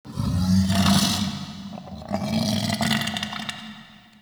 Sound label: Interior Burp Hiss; Ambience; Indoor; Warframe; Infested Eris